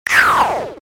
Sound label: Multimedia Internet CD Rom Flash Blast